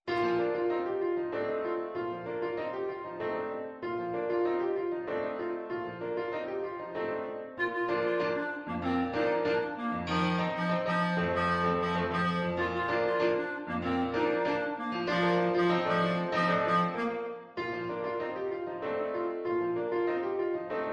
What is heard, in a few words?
jozi jive, clarinet